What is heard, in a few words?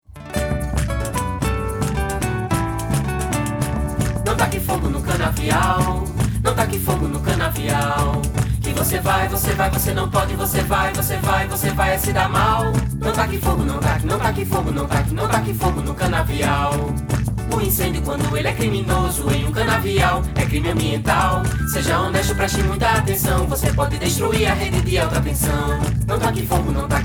Jingle; Queimadas